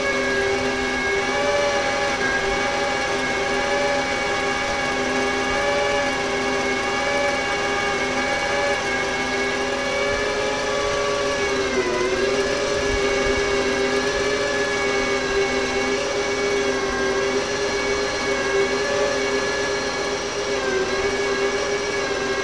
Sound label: Fuel Truck Pumping Loop; Trucks